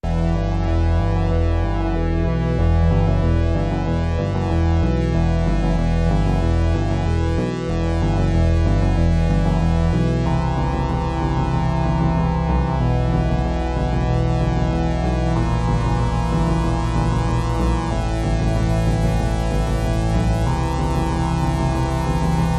Ambient

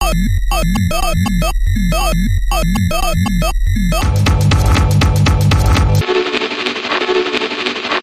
True Grit Electronic Bumper Reversed

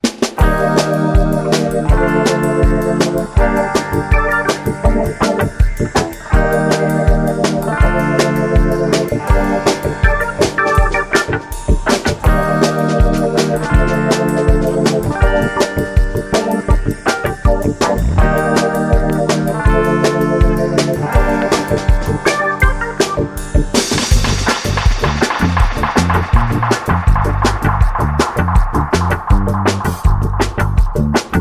Big Wave Ska

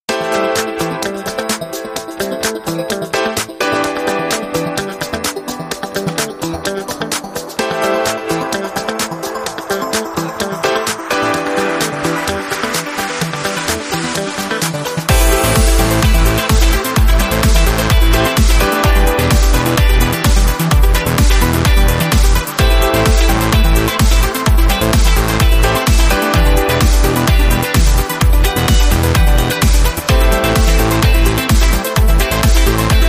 No Vocal Samples and No Strings

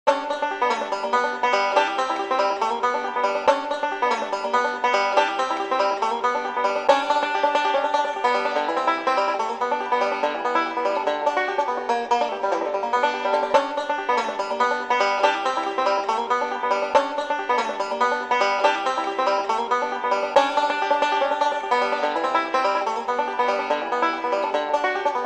Banjo Saloon

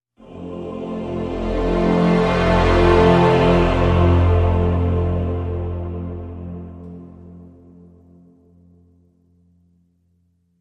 Epic Growth F Major

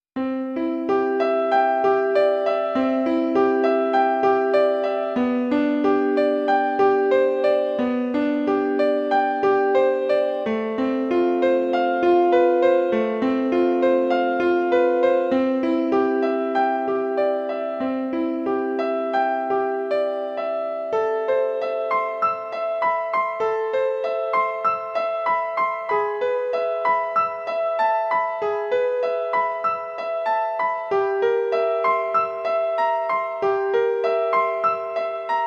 Piano Solo

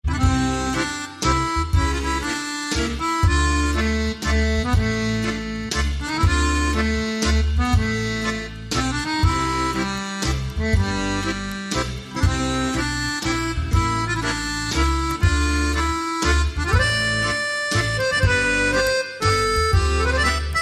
Storytellers Waltz

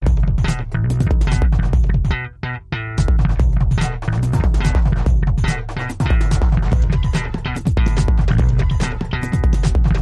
Slap It Up Underscore

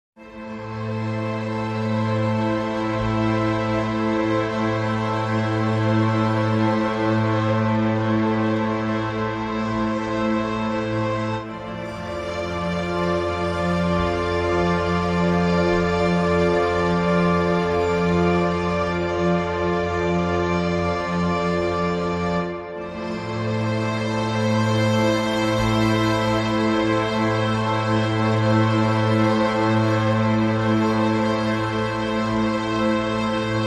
Strings Only

